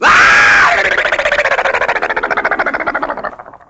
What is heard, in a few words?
"ah bbb" cartoon; Voice; ooh ahh